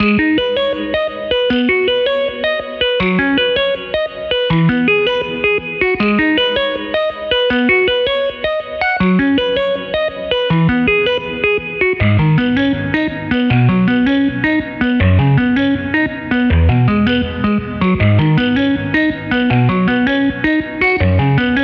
songstarter 160 G#m, Pop; Slate; SD PASADENA Pop Sample Pack

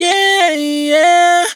riff Yeah Airy Tuned Gm, Dry Vocals; Raw Vocals; Slate Digital Omnivox Vocal Sample Pack

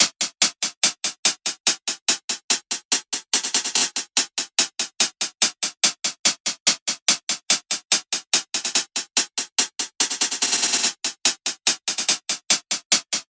hats 144, Hat Loops; drum sample pack; Ibu; SEISMIC; Slate

